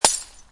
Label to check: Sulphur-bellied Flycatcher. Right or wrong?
Wrong